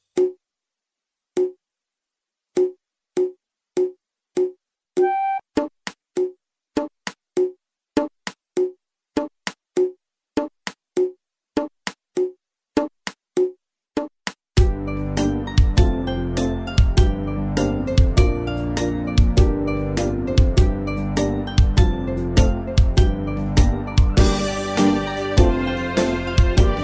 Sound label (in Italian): base senza melodia